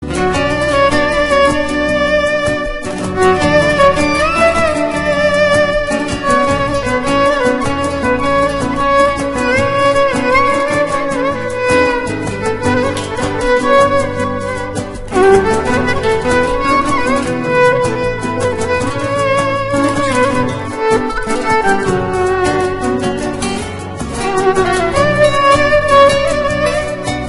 türkische musik

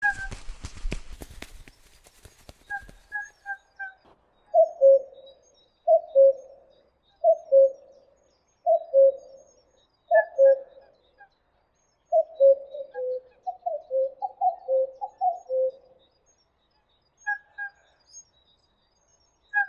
kuckuck

